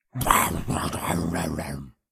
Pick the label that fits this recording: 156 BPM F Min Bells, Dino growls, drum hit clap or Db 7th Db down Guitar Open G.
Dino growls